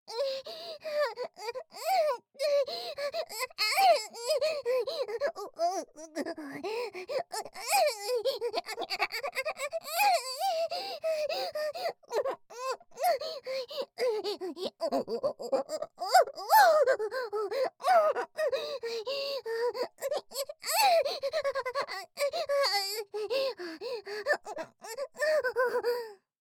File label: 搾精悪魔ちゃんの5日間寸止めゲーム～最後の日に失敗しちゃったら惨めな結末を迎えるよ～